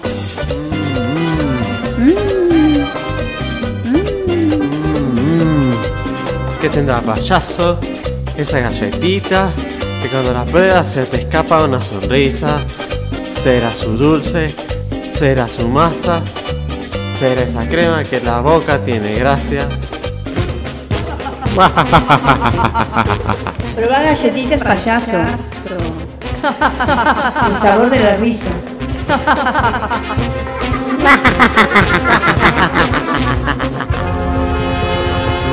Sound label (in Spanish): Jingle; Galletitas Payaso